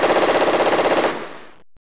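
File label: machine gun burst; ambience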